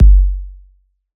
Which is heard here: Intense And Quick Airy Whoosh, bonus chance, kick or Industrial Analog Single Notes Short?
kick